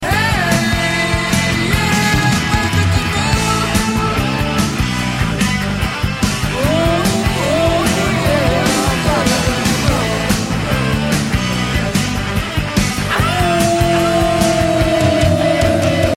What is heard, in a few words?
bark moon ringtone